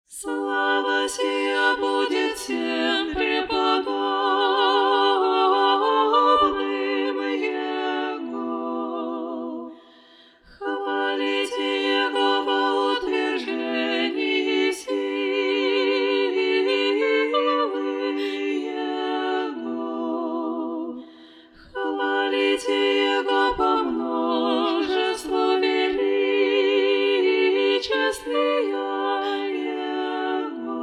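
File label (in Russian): zapevy na khvalitekh glas 4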